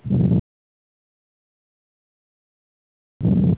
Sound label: vsd, heart sounds